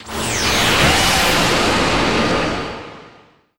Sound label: Wavecannon Shot